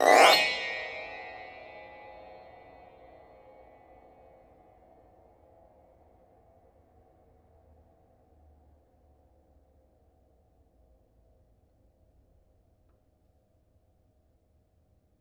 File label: String Brush, piano; Piano Miscellaneous